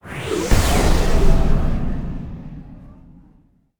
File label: aura burst out